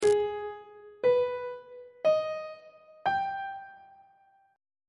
g sharp melodic minor 7th chord 1 is g sharp minor major 7th on treble clef